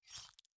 gore dash

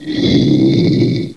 Zombie Breathe; Knight Online

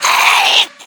Moon God Hit; enemies; unsighted

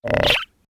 Alerted; Bobbit

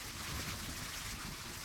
Blackboard Erase Loop

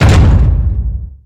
Footstep Huge Metal Robot; Mars Sortie

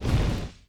Fire Elemental

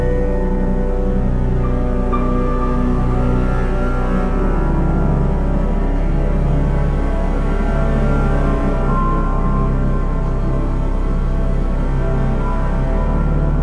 BGM dungeon labor; starbites

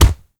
Punch General Body Impact

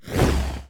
Void Fireball

